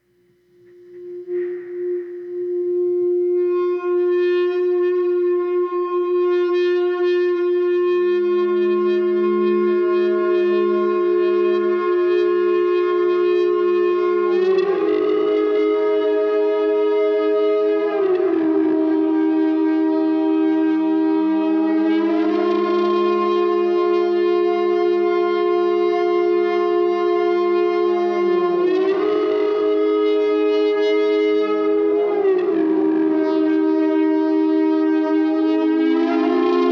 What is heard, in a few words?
ebow experiment